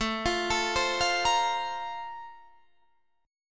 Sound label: A sharp Major 7th Suspended 2nd A